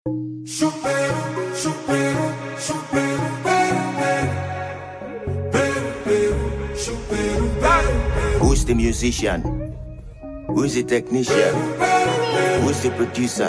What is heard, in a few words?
Afrobeat Instrumental, Hook Open Verse beat; workwithwhimzy